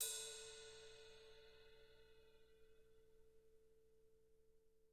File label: hat, drum